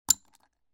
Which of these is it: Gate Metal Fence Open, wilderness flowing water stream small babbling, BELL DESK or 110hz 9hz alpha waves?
BELL DESK